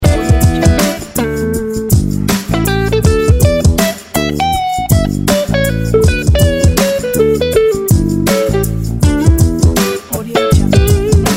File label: funky guitar loop